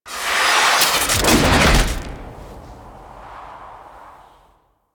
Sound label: Fly In Detach Land; Sentient Devourer; Sentient Spawn Outdoors